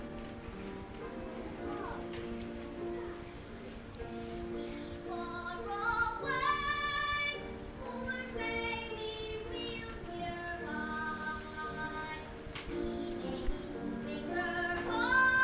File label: singing